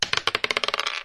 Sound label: dice roll; Dice World